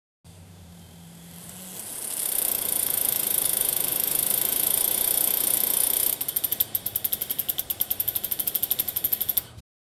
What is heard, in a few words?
racing bike sound